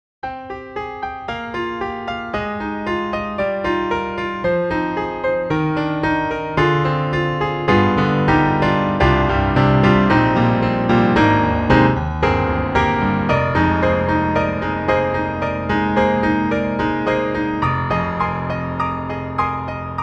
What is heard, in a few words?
D-PRO, Mini Piano (mono); Cakewalk